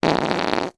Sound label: human fart